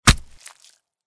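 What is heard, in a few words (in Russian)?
удар в тело с разломом; Звук ножа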